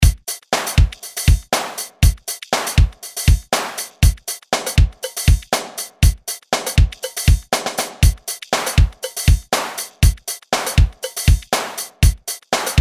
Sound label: Dance; RP Punch Dance